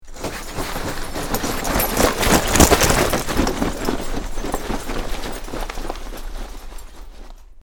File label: Heavy Gear By Close, cop gear